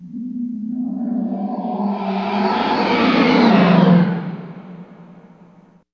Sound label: hollow sideways; The Official Guide to Babylon 5